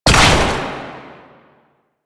Ranged Weapon Fire; Warframe; Necramech

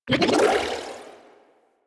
Cast; Warframe; Anima; Anima Animus

